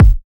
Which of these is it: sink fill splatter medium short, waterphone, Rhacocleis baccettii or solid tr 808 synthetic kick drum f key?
solid tr 808 synthetic kick drum f key